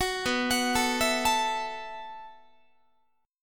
B 7th Suspended 4th B down Guitar

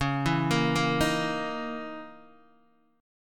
Db Minor 6th Add 9th Db down Guitar Open D

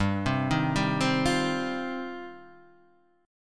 G 7th Suspended 4th Sharp 5th G down

